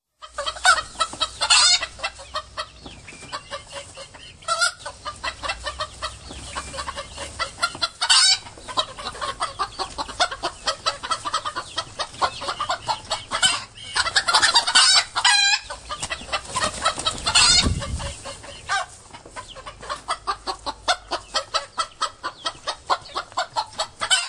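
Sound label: rooster fights in battle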